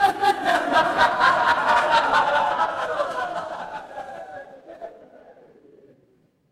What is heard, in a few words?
crowd laughter